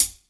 Good Hihat Sample A Key, Royality free hi hat one shot tuned to the A note